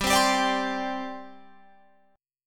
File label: G Suspended 4th G down Guitar Open F; Gsus4 strummed